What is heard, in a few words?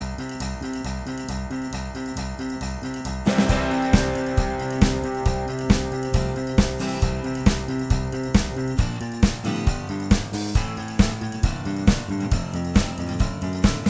instrumental; amp regional arts and media program